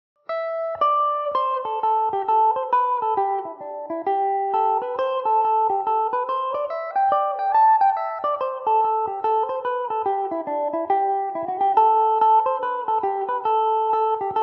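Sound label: hornpipe A dorian mandobird, irish mandolin; The Bird’s Hornpipe; Epiphone Mandobird VIII electric mandolin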